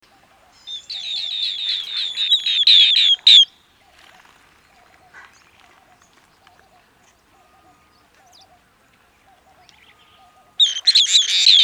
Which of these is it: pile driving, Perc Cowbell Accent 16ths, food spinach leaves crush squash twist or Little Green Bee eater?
Little Green Bee eater